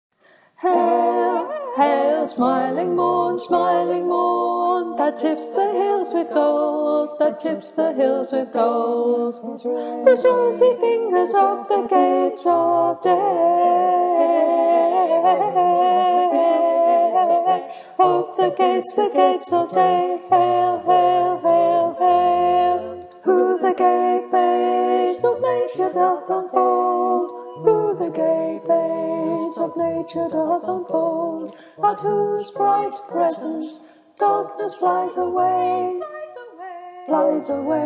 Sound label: Alto